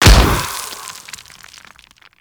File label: Tree Slam Explosion; Enemies; Abilities; Warframe; Teralyst